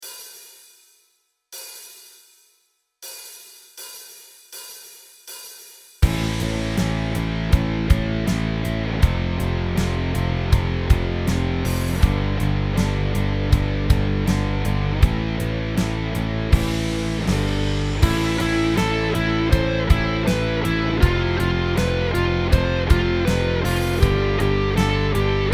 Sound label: Powerchord Song